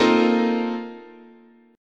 Asharp Major 7th Suspended 2nd Suspended 4th, chord, guitar